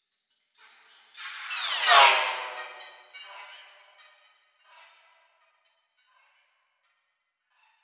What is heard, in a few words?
scary fx